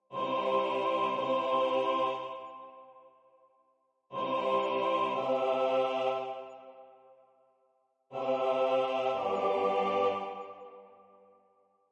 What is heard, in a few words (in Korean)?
주3화음 전체